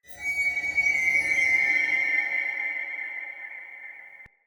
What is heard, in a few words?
Day Texture, Ambience